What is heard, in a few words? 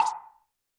Roland TD-20 Percussion